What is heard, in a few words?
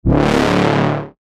BSHOT D; Pinata; revealed bass shots vol 3